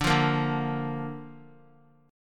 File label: D Minor Sharp 5th D Guitar; Dm#5 strummed; JGuitar